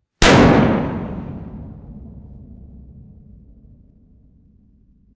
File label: single gunshot inside reverberant metal tank